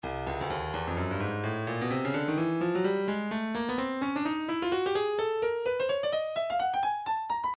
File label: breakbeat 128 bpm; Stylus